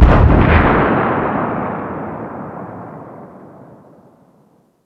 rocket explosion far

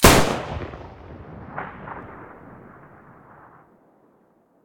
muzzle raging mono, weapons taurus

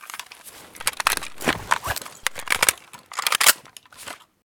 reload empty, weapons; vpo101

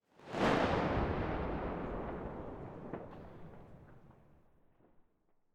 gauss shoot distant, weapons

